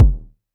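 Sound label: vermona drm1 mk3 bass drum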